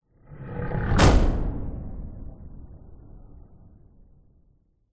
sound design whoosh dark growl into hard glitched impact